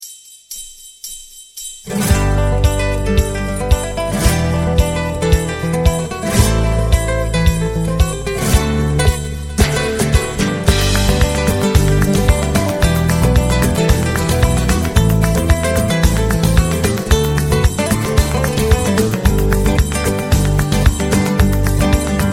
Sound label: latin christmas ringtone